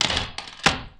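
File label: 120 BPM; RHYTHMLOOPS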